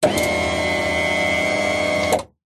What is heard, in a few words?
hospital bed electronic motorized lift whirr